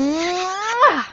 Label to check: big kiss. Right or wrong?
Right